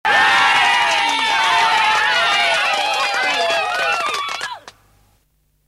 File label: 80s - Cheering